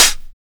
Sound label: Hat